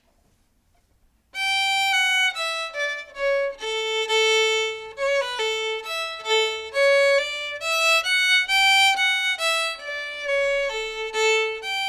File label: The Flowing Bowl slow